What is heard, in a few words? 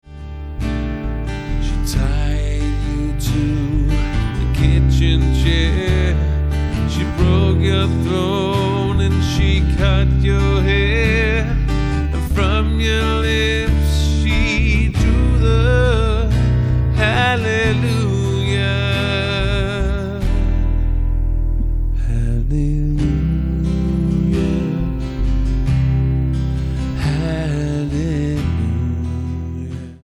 Halleluja